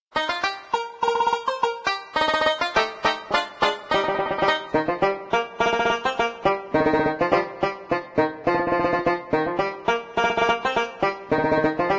Banjo Duet; Zomboid; Lifestyle; Oh Susanna

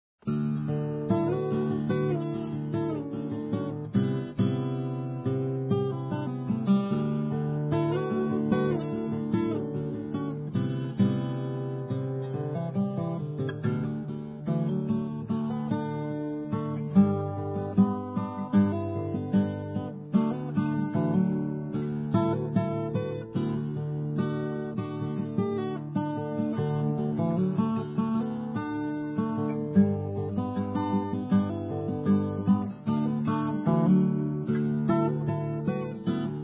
guitar acoustic; Zomboid; Lifestyle

